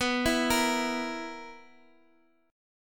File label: B Major 7th Suspended 4th B down Guitar Half Step Down